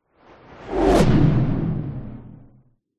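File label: warfare missile rocket fly by fast powerful before impact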